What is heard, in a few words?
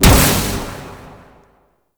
Tendril Grasp Weapon Fire C; Warframe; Broken Frame